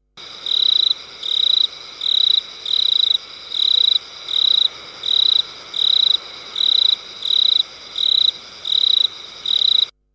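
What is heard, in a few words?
cicadas; cikady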